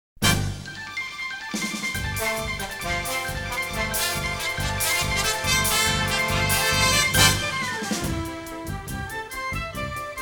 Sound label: Instrumental Score